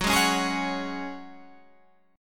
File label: F sharp Suspended 2nd, Guitar Standard; strummed; JGuitar